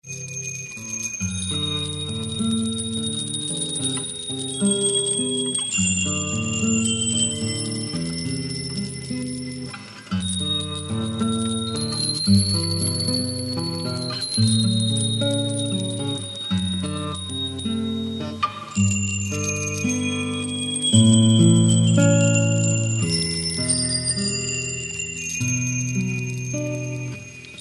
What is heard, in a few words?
keybord